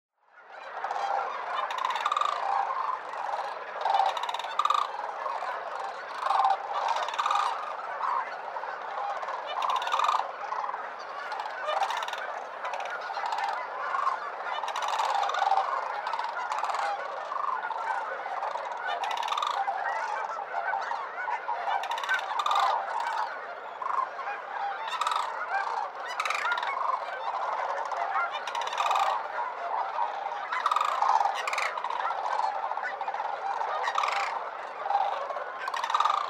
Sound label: Sandhill Crane